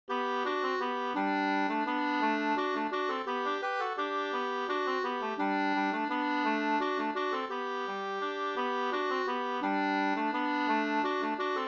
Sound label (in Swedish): vals